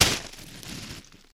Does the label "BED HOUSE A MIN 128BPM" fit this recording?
No